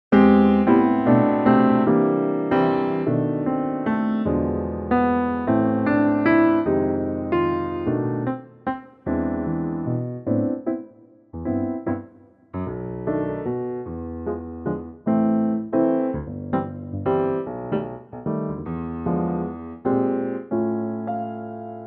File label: Am 3/4 piano